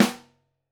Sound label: SN1 Overhead, Snare